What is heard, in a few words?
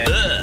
ugh